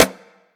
reverb hand clap sample f sharp key, Royality free clap tuned to the F# note.